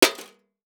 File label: Impact VCR Hit By Solid Metal Pole; Impacts